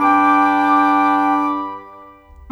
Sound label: Winds, Orchestral, Rock Pop; Sonic Foundry (Sony Creative Software); Orchestral Series VOL-4; Rock and Pop; Rock-Pop 04